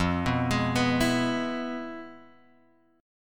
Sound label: F Minor Major 7th Flat 5th, F, Guitar, Open G